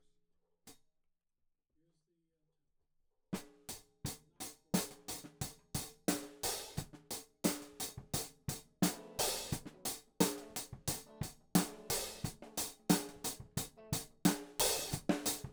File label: Hat